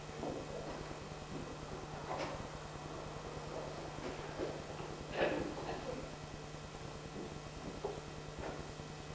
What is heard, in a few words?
crackle audio